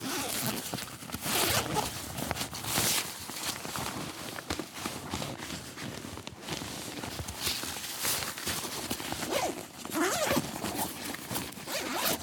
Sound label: Backpack, Rummage